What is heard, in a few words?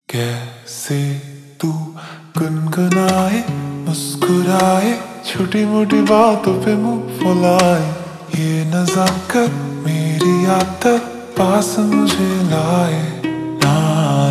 Ringtone Slowed Reverb